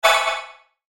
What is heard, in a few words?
Whoosh Shine Pan Delay; Blastwave